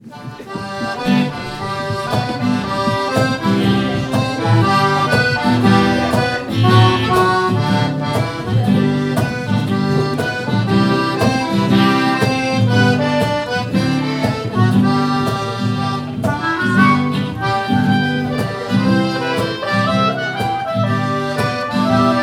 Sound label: Hornpipe; Gloucester